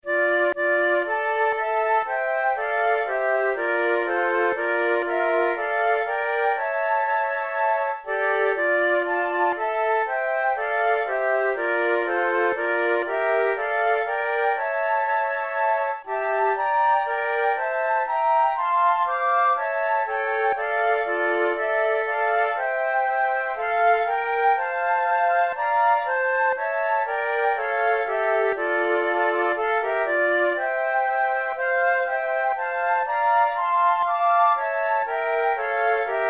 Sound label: trio, flute tunes